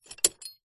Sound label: horse saddle horse stirrup iron metal foot in movement exaggerated; animals